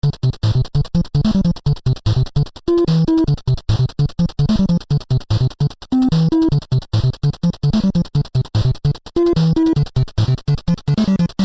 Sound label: Hardstyle Samplature